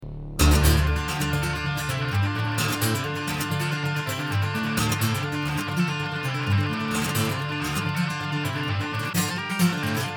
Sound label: Fast Riff